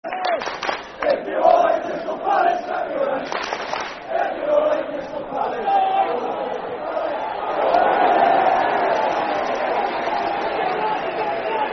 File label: Football Chant (Soccer Song), Brighton; If You Hate Crystal Palace; Brighton Bhafc